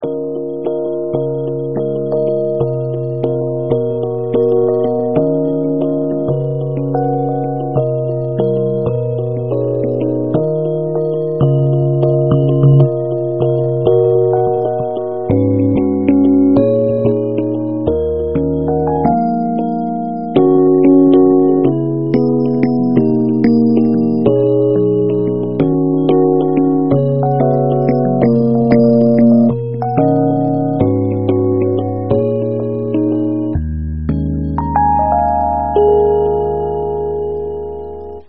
高音を強調したエレピの音色です。さわやかな朝の光と緑の清々しさの感じです。; 窓を開ければ木々の緑が

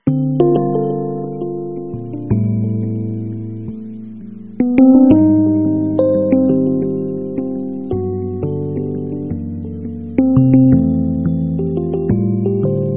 ワンパターンながらエレピの音色です。軽やかな感じに仕上がりました。; パイン床と白い壁の部屋

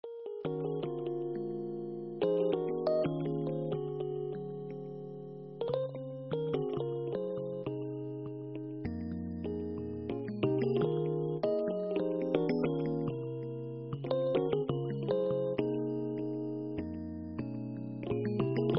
日本の童謡をJAZZっぽいｺｰﾄﾞ進行にしたら第6弾。; 日本の童謡E JAZZｺｰﾄﾞ風もみじ

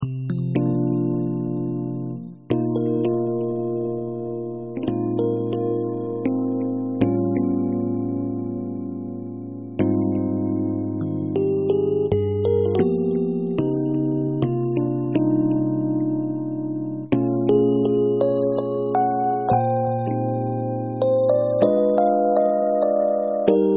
やわらかいエレくトリックピアノの音色にリバーブとディレイを少しかけて幻想的にしています。; ミッドナイトベッドルーム

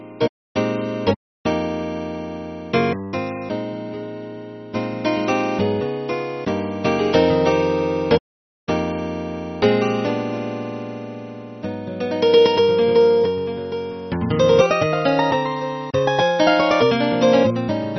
日本の童謡をJAZZっぽいｺｰﾄﾞ進行にしたら第4弾、ナイロンギターに音色変更＆2倍速に。; 日本の童謡C JAZZｺｰﾄﾞ風かっこー

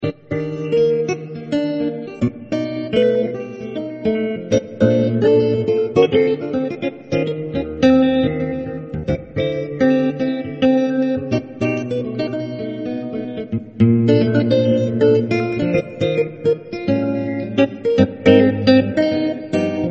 ナイロンギターの音色にエフェクトをかけています。; もう少しかすれた丸い音にしたかったのですが; 忙しい夕暮のひと時

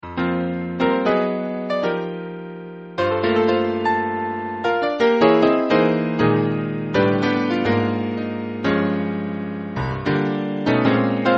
力強い感じを出すために、グランドピアノの音色でぶ厚いコード感を連続させて2倍速にテンポアップしてみました。; ライトアップされた石壁と樹々

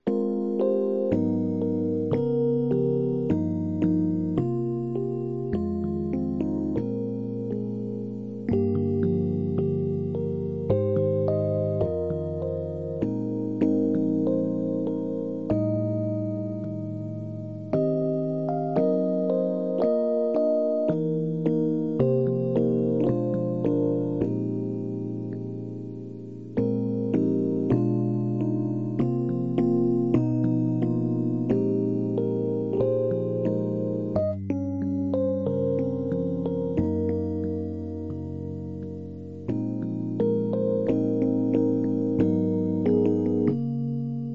日本の童謡をJAZZっぽいｺｰﾄﾞ進行にしたら第7弾。 エレピの音に音色を変えて; 日本の童謡F JAZZ風夕焼け小焼け

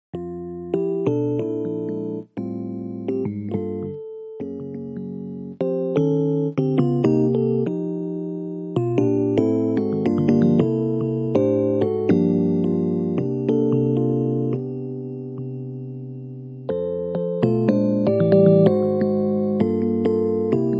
日本の童謡をJAZZっぽいｺｰﾄﾞ進行にしたら第3弾、エレピの音色でゆっくり弾いて2倍速に。; 日本の童謡B JAZZｺｰﾄﾞ風さいたさいた

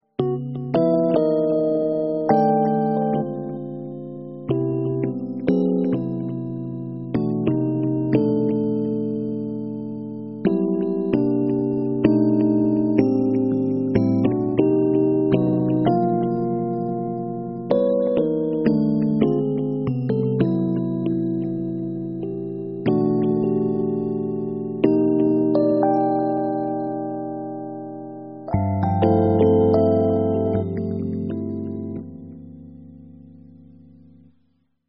エレピの音色にディレイをかけてテンポを上げています。; 遠くに暖かい我が家の明かりが

